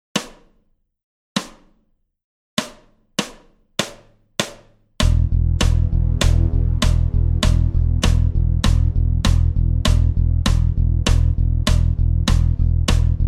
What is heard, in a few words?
LICK SLOW